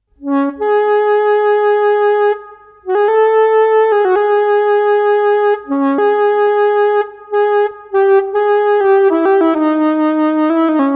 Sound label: Eastern saxophone